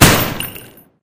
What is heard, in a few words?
shoot, crossbow; minecraft